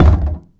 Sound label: underground cube soft